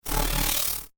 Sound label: Alien Insectoid Door; Alien Sound Effects; science fiction sound effect; Andrew Fleming